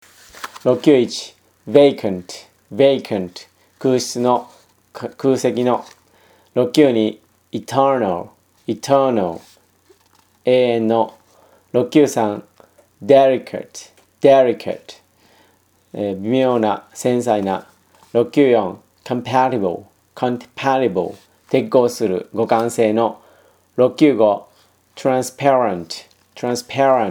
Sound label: tango